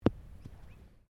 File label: Golf ball impact grass; Sports; Various Golf sounds